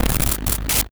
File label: Sound Powerup Super Capacitor Trigger